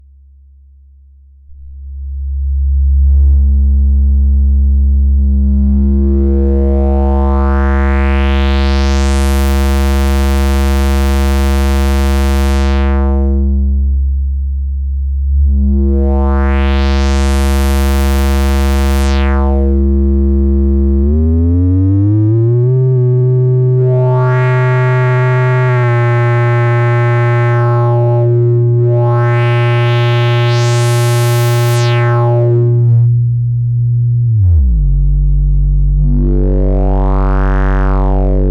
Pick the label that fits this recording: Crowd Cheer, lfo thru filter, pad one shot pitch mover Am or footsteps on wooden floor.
lfo thru filter